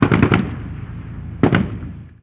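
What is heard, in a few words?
gun us assault medium; ModernWarfare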